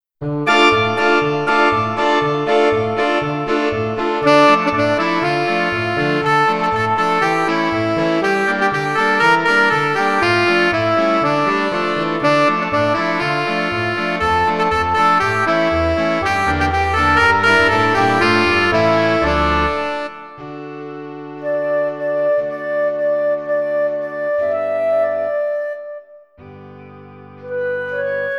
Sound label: Backing; mayors christmas carol music